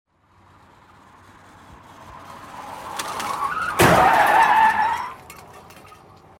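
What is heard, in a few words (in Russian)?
наезд на препятствие и удар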